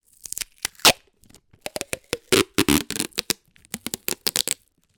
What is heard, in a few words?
sticky tape rip roll gentle; office; zapsplat